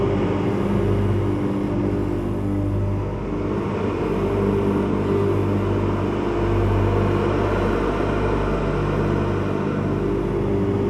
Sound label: Fight Arena Loop; Soulframe; Enter Deora Garren